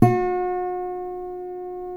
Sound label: G 4 MARTIN 6, Guitars; Spectrasonics; Hans Zimmer Guitars VOL-1